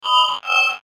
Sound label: Transition Multiple Tonal Shimmer; Multimedia; Blastwave